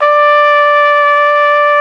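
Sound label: flugelhorn; Best Service; Alpine Volksmusik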